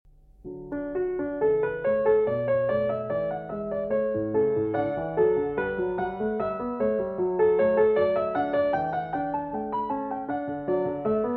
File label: Kleine Präludien